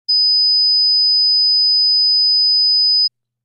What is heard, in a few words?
hearing test tones